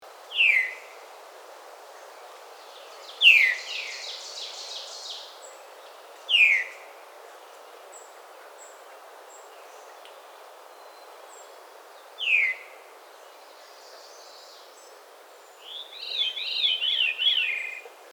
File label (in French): grive fauve